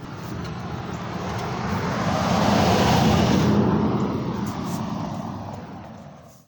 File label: Car Passing By